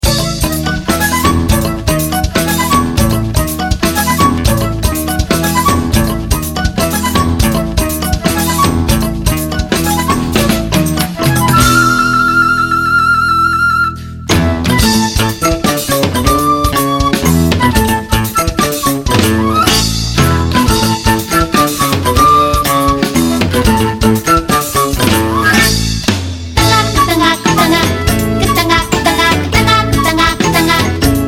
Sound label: Balafon